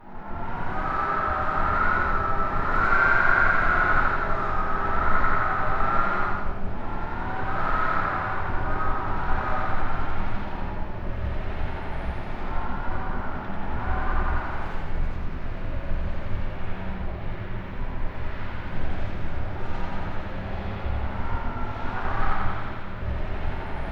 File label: SORR WIND, ATMOS; AKAI Anime Sound FX